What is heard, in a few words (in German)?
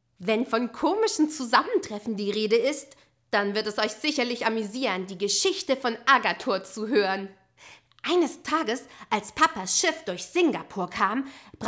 Euphorisch